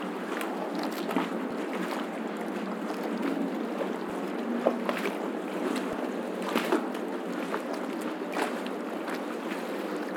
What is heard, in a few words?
ambience canals water